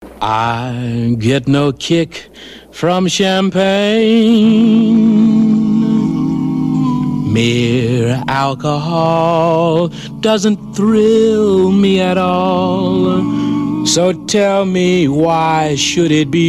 Work Song, ringtone

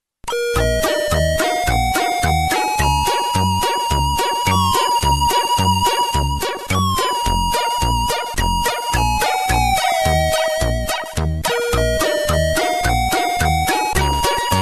8 bit, ringtones

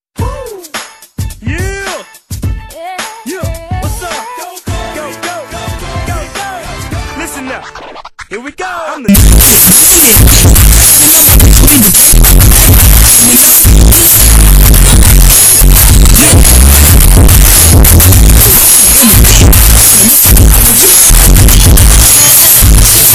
bass boosted

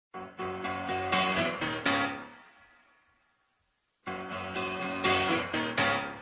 piano intro

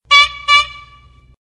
horn sounds; ringtones

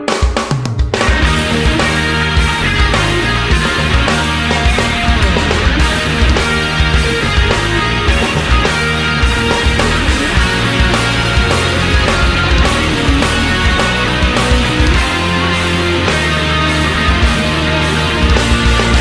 metal; metroid; rock

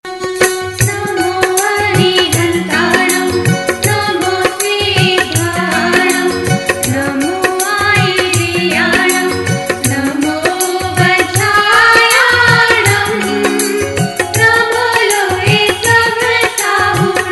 jain navkar song

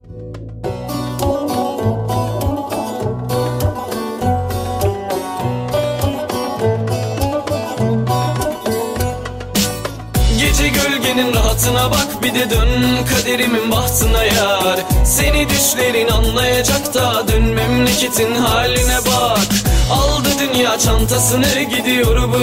Turkish Hip Hop

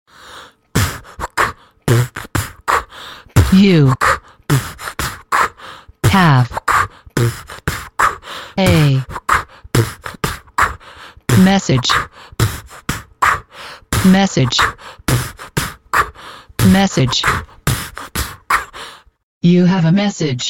beatbox rap

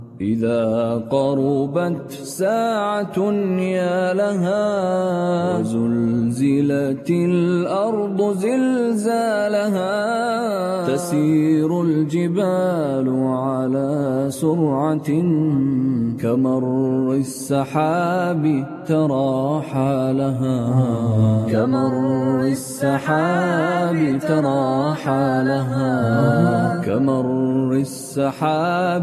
nasheed

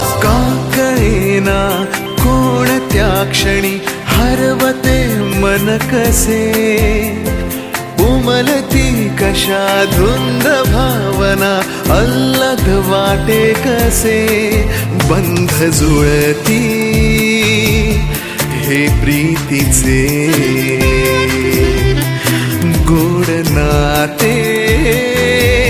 marathi mumbai

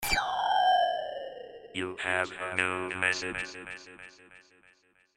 HTC Sci Fi SMS

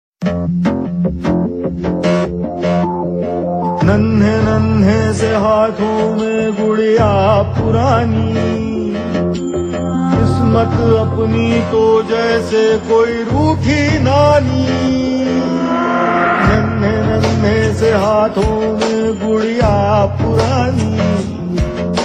colors tv ring tune

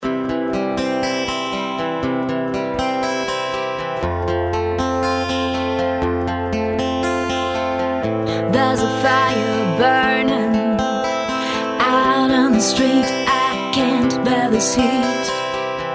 cut, hip hop ringtone

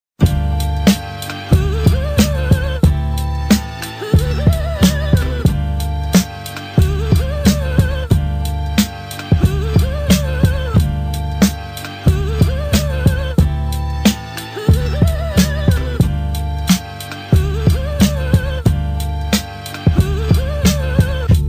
Triumph Ringtone

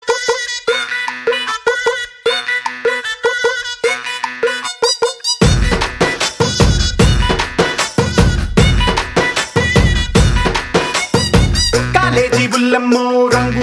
Beat

